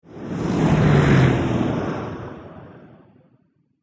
Auto Aston Martin Pass By Medium Speed Automobile Car; Vehicle; Cars